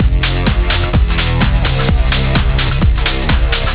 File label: music